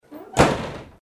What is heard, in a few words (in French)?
porte